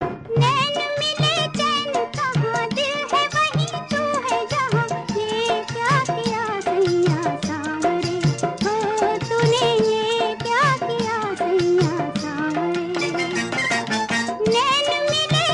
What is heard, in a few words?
Old Hindi Song